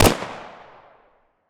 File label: glock, weapons; glock18; Counter Strike Model Pack; CS2